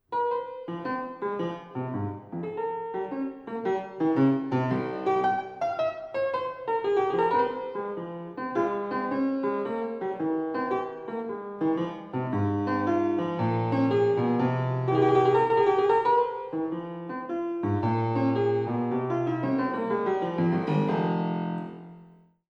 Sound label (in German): Sonate f Satz 1